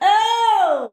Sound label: OOH, SHOUTS; Best Service; Hyper Dance